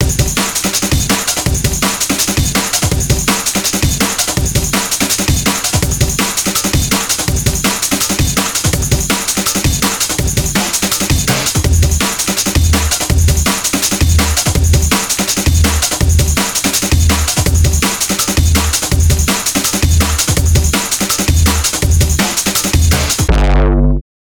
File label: Crowded Drum